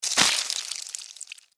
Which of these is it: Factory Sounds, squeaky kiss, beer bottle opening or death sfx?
death sfx